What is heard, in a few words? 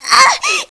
loop, weapons